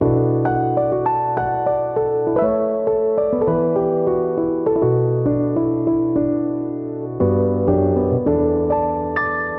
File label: inspiring melody b min 100 bpm; monosounds; evoque